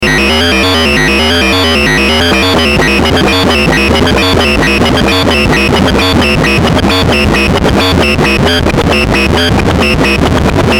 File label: dynamic sample rate